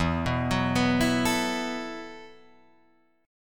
E Suspended 2nd Suspended 4th E, down, Guitar, Drop D